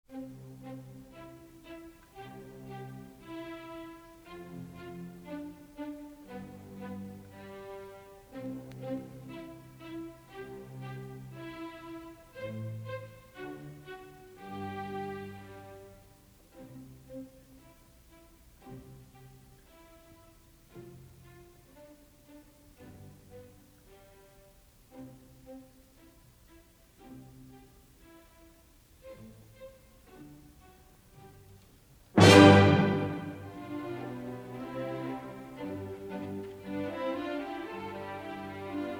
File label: surprise symphony no. 94 in g major andante